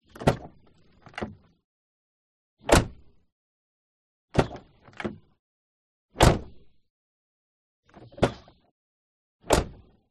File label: AUTOMOBILE S-TYPE Jaguar Door; 2000